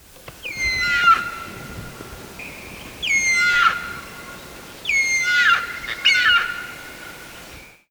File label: Rock Hyrax